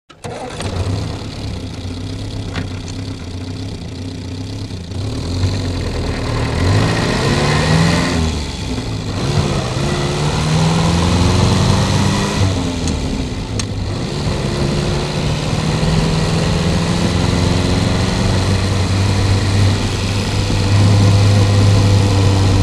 Automobile; Interior; Interior Austin Ruby Start Up And Away Through Gears To Constant, Finally Stopping, Idles And Switch Off.